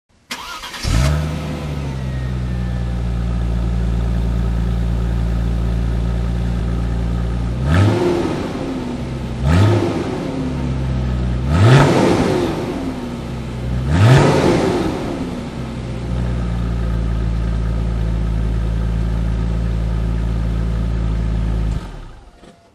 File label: exhaust, audi s4